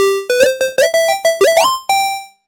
victory; freepixel; Game Events

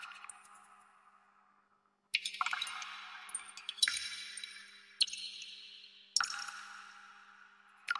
cave drips; Ambient; ambient loops

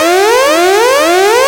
danger warning; Sfx; freepixel; Tilter

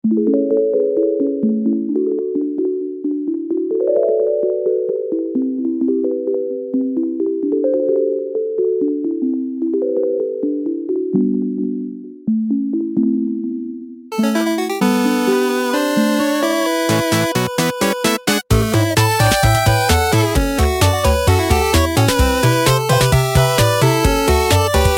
hope rising; freepixel; Emotional

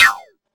crossbow fire; Weapons; freepixel

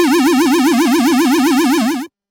rolling boulder; Traps & Hazards; freepixel

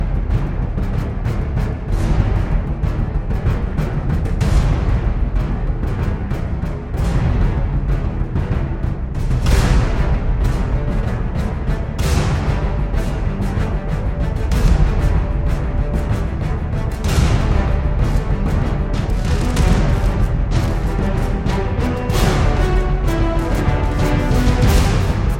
battle normal; freepixel; WordJourney

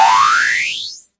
laser charge; Sci-Fi; freepixel

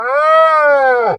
cow moo; freepixel; Farming

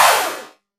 vampire hiss; More Creatures

